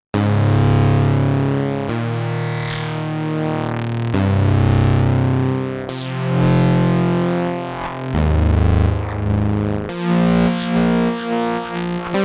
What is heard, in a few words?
Kobol Filter FM LFO